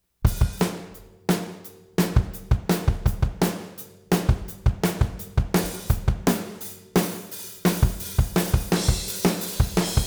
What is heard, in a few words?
drums